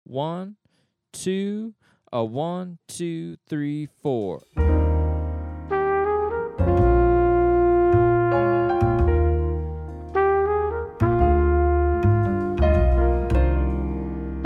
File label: No Drums, Play Alongs